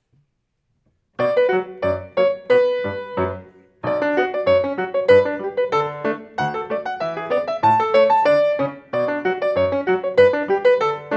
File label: Bm Ecossaise 90bpm; Schubert